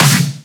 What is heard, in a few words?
SnareClap; FLPRO